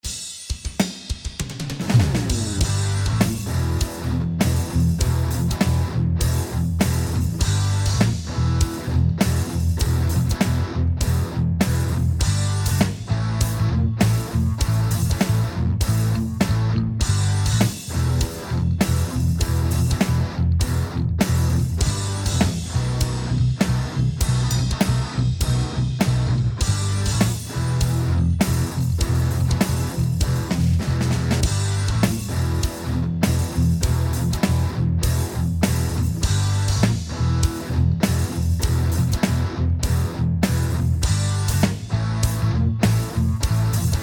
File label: Backing Track; impro